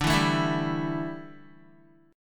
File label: D Suspended 2nd Suspended 4th D down Guitar